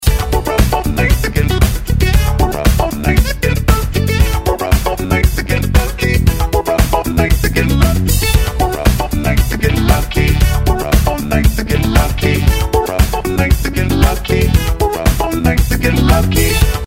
Vocoder

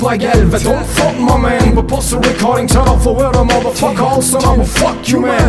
vocal

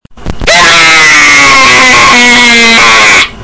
scream

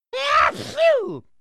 sneezing; mario

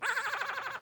laughing red bird

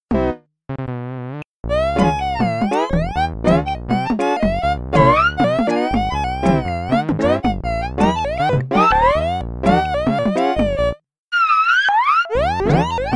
nokia ringtone arabic but vibrato

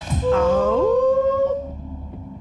awoooo; shangela vs mimi imfurst

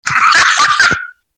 laugh

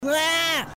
scream; bart

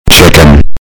sexy sound

